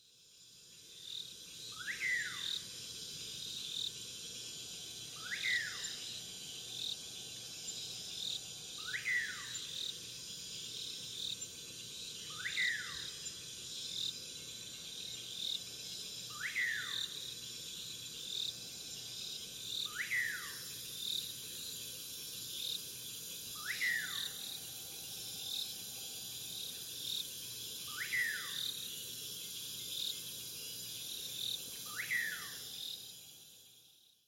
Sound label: nyctidromus albicollis, aves; historia natural; galeria biodiversidad